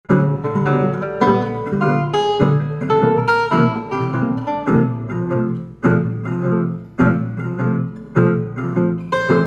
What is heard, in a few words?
reverse la pompe